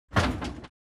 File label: atlas rattle int; Titanfall 2